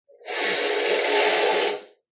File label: Pull Curtain, Curtain Slide Close; Window Sound Effects; Household; Lynne Music